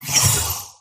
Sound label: death; skyscape